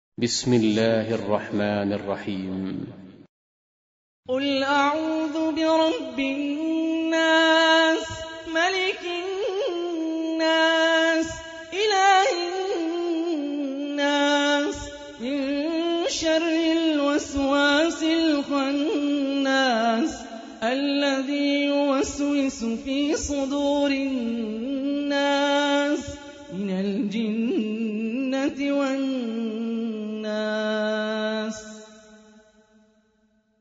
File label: Quran; Hani Refaey